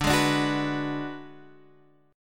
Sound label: Ab Minor 7th Flat 5th D